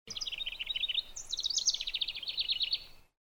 lincolns

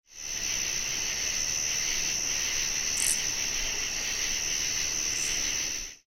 Oblong-winged Katydid

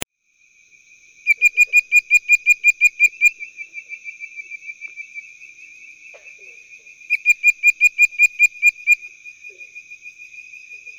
bird voiced tree frog

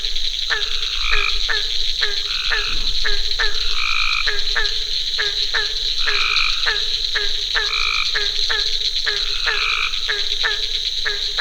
Hyla Gratiosa, Pond; Tom Elliott Pond

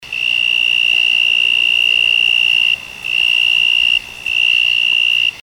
Daviss Tree Cricket

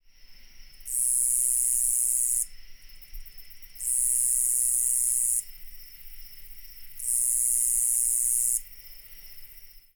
Nebraska Conehead

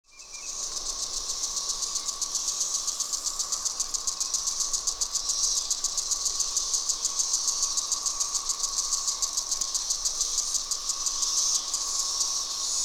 Magicicada Species

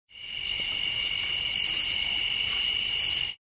Lesser Anglewing

